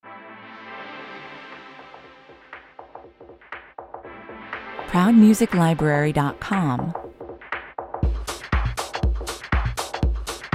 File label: groovy blip 120 bpm